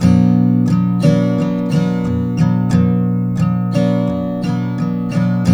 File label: TK MIN C, Guitars; Spectrasonics; Hans Zimmer Guitars VOL-1